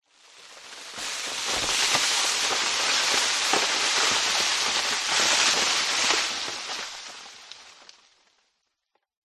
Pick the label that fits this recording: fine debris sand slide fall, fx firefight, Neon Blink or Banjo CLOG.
fine debris sand slide fall